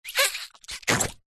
cartoon wet rubbery movements; zapsplat

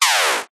multimedia laser weapon fire

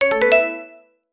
app alert tone

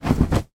foley blanket shake; sound effects one

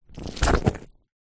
food lemon slice knife; zapsplat

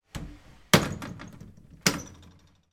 household door louvre wooden close then open

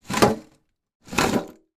chocolates tin tip x2; food; sound effects; sound effects one

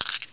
Gems Few; little robot sound factory

